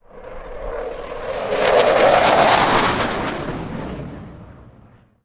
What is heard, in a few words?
flyby